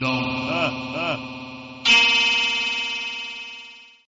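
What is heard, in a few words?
Funk Notification Ringtone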